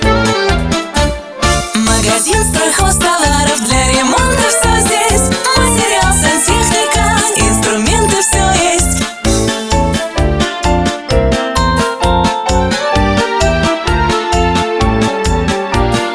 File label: vocal; stroihoztovari